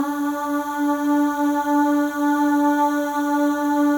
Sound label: M+F AHS; Spectrasonics; Symphony of Voices